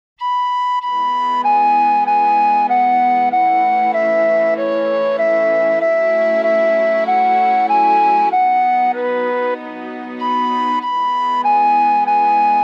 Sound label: Karaoke Sisu Nae Taupe He Koluse